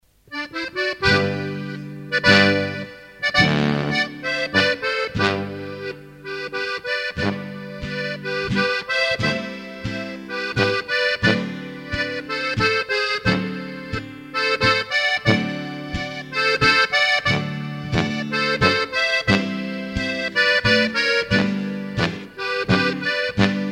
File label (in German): Plattler; Ribaupierre